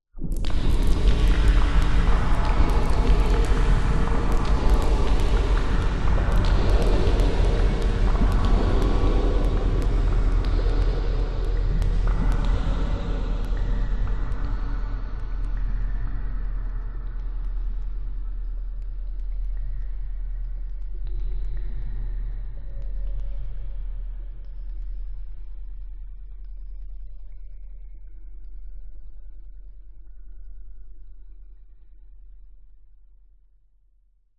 sound design cinematic drippy wet horror dark drone hit atmos